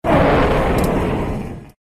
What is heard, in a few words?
roar; Gomess